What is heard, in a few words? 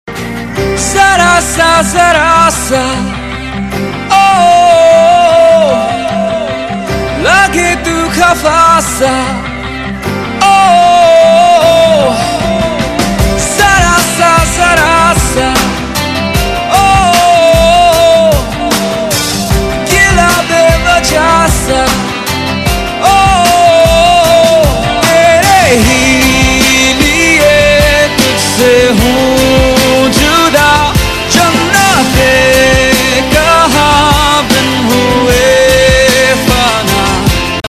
Power Ballad; Bollywood